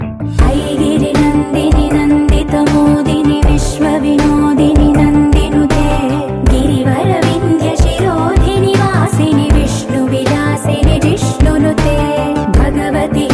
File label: durga stuthi; Navratri Special